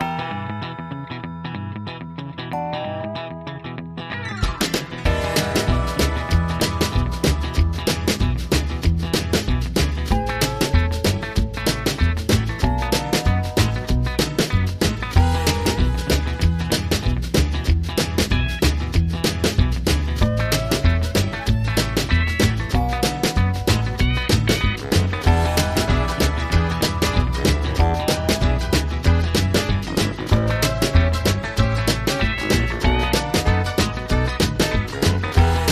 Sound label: Redneck Rockabilly, Underscore